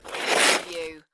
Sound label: Snow and Ice, Ice scrape moderate